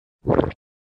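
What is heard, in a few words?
sounds of swallowing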